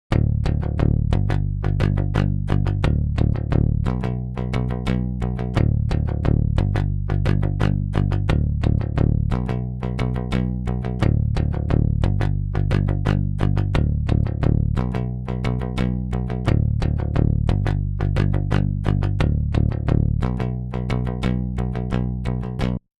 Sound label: pop bass